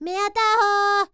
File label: Vocal Planet World Voices, NOMADIC